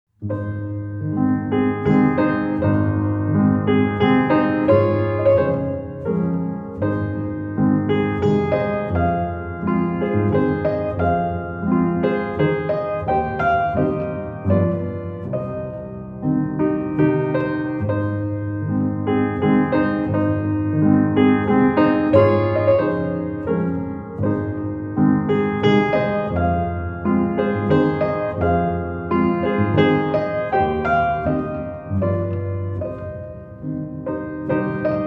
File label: Piano solo